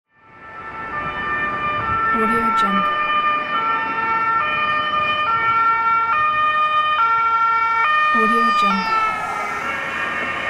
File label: ambulance siren